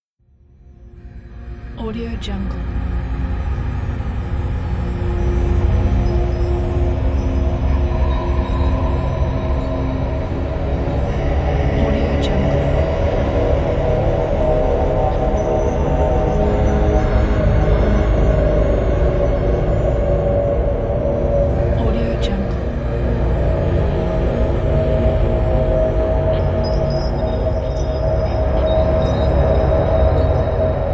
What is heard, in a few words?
strange ethereal scifi atmosphere